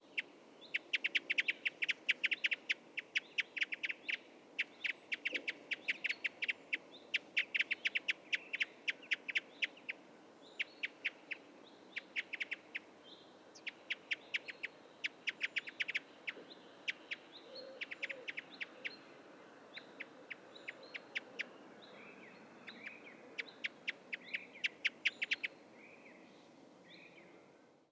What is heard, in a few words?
Parrot Crossbill Excitement calls of pair mobbing Northen Hawk Owl